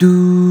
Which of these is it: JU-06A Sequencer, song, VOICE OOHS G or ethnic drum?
VOICE OOHS G